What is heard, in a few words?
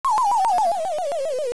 Fall Tune; Link falling (tune)